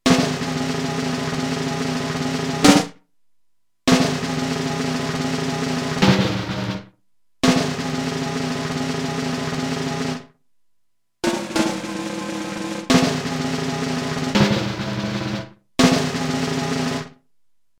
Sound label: Snare Roll